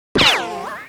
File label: ricochet; gesource; physics; impacts; ricochets